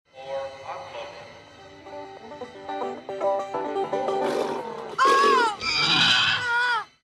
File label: street banjoist and pooch